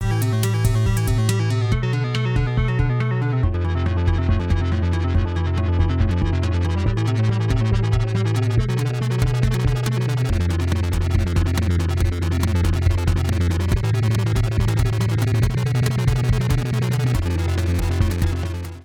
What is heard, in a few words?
Behringer Neutron Audio IN Filter Mod by OSC